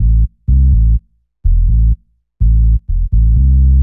DEEPHOUSE BASS 125 A

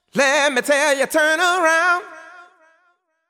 deep house male, 125, A, vocals

